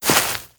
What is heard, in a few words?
Grass Walk; moul-assets